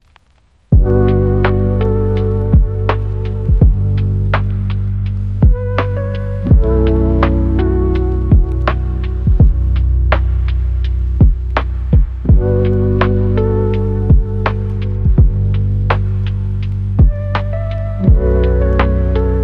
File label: Chill Lofi